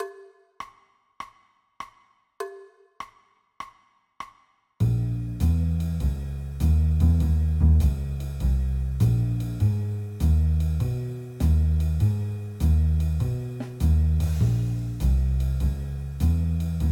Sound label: Minor 251 Gm Bm, jazz piano